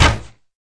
bow loose; items; Minecraft; Remastered

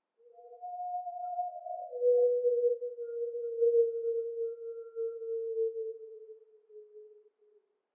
wolf

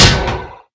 hit, iron golem

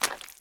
mud walk, step

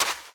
sand run, step; Minecraft; Remastered

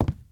wood walk; blocks; step; Minecraft; Remastered

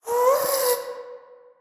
moan, ghast

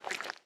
slime walk; blocks; Minecraft; Remastered

